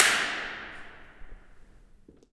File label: impulse